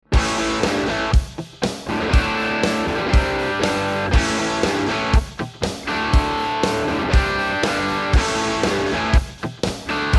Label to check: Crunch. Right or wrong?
Right